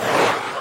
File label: mail sent whoosh